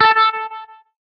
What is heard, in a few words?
hitsound menu note